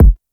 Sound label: Hip Hop Pack